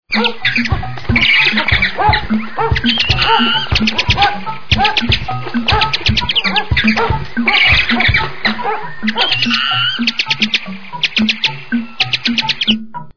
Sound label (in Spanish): efectos sonido animales efectos